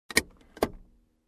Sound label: Car locking door